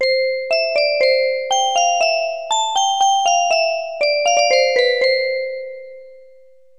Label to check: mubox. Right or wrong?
Right